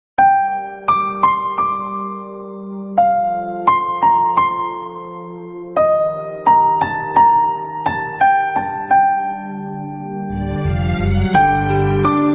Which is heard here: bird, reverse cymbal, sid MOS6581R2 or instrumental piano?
instrumental piano